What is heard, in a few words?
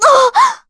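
Damage, Korean; voices; heroes; Veronica; kings raid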